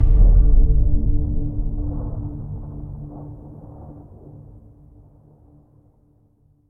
Combo D; Mixolumia

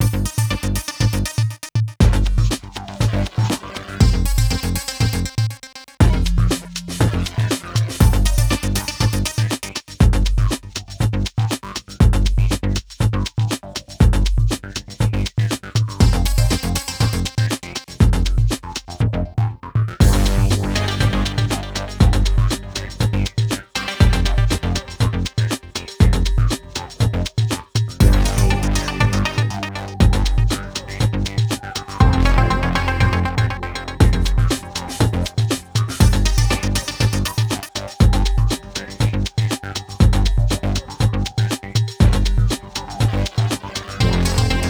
menu; Knight Rider The Game 2

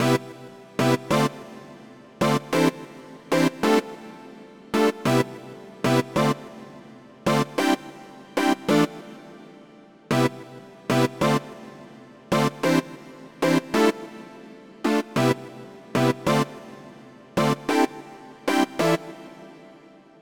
Poly Stacks

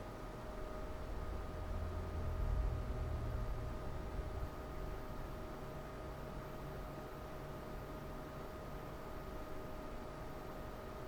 computer

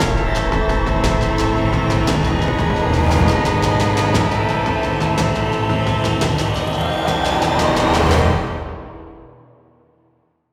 Dialog Danger One Shot; Skykeepers; PS4, Windows, Xbox One

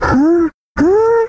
Classic Miss Sound; Jolly Battle

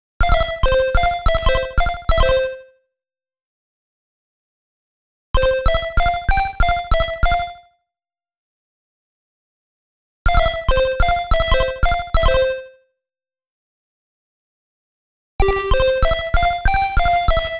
8 bit melody; Vostok Inc.

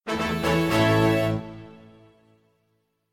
tada; Kalimat Karash; Android, iOS

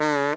Fruit Noise; lego my world first steps

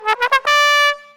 gui character promotion; Rescue 2013 Everyday Heroes

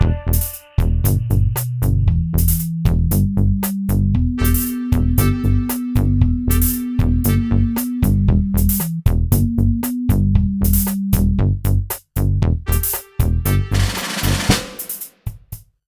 Downbeat

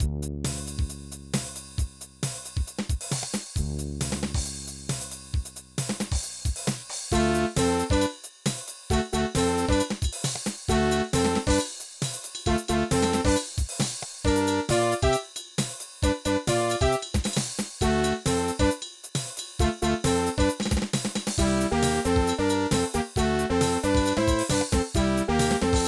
Incineration, Roland; blake stone aliens of gold; ms-dos

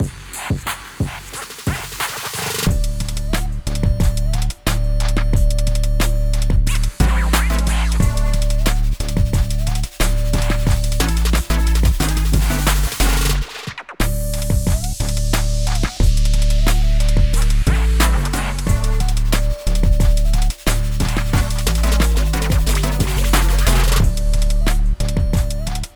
Spotted!; Hoopster Linebacker Striker Infielder Smasher Skier Boarder

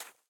Footsteps Snow; Main Character

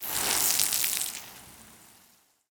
liquid; Teardown; Xbox Series X/S

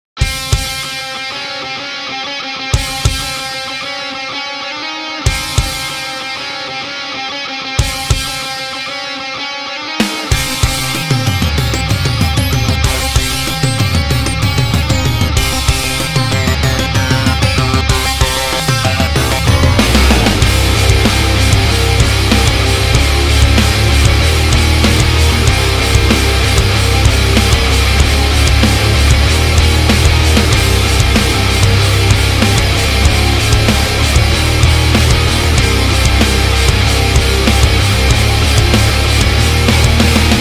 Energy Metal

